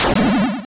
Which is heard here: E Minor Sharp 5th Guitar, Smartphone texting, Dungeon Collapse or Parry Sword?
Dungeon Collapse